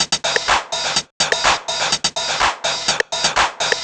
drum breather top 125, Classic Chicago House Drum Loops